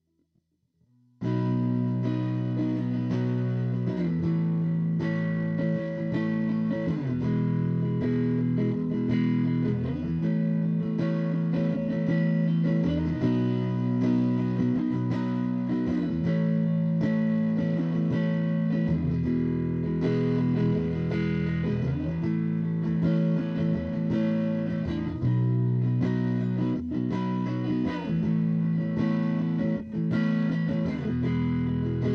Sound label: E160 Strummy GTR chords